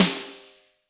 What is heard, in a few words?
SNAPPY SN, ALLDRMSTD; Big Fish Audio; Synth City